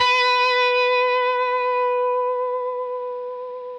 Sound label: Left hand vibrato